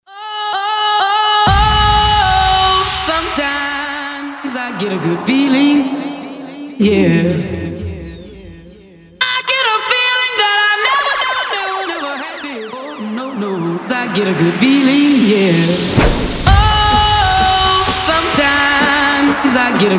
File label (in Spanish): Acapela Starter, 128 Bpm